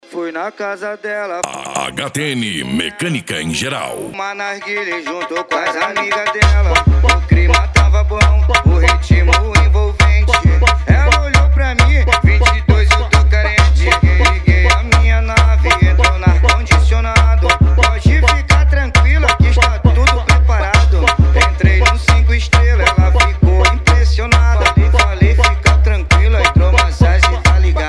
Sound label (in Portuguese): funk e lambadao